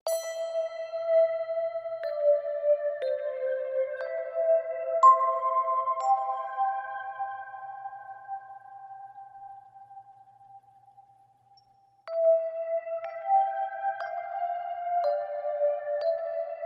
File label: Rhodes Loop; Bathroom